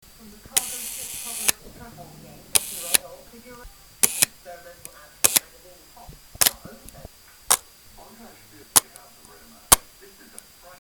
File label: Compur